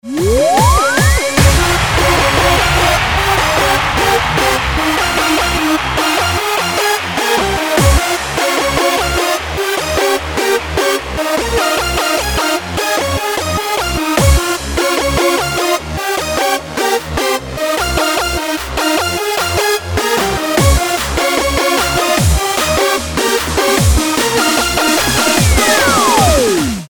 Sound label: rave dance